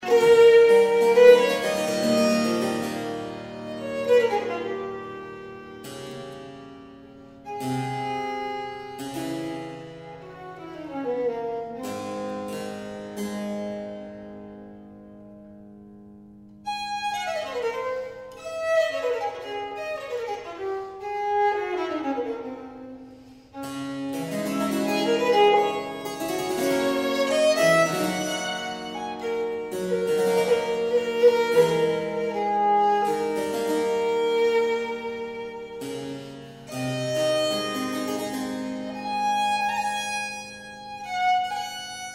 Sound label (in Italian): Sonata IV per il violino per sonar con due corde